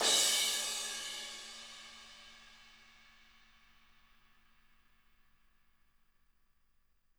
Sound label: CRSH, CRASHES